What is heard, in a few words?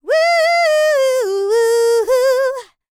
LICKS, Vocal R&B Dance; LT DANCE